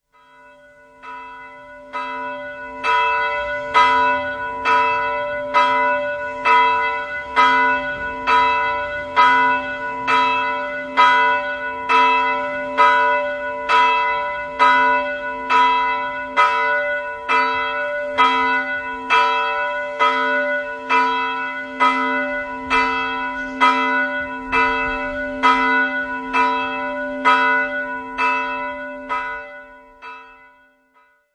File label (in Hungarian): HARANGSZÓ REGGELRE; STUDIO KOLOZSVAR; napindito